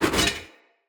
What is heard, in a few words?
Spin Whoosh, Swords; Weapons; Mockery; Mockery Turash